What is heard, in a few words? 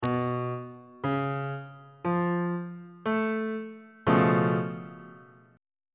c sharp augmented 7th 3rd inversion on bass clef